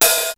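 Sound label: Roland 626 open hi-hat; Drums; Roland Tr-626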